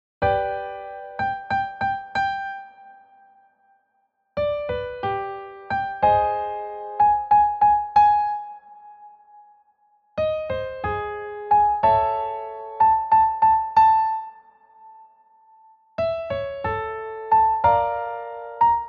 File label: Octave Repeat Sustain Down Upper Range Extended